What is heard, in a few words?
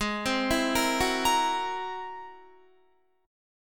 Ab Augmented 9th Ab down Guitar, Ab+9 chord; arpeggiated; JGuitar